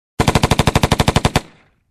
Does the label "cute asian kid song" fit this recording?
No